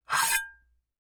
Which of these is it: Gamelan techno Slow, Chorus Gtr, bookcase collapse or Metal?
Metal